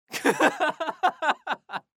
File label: Laugh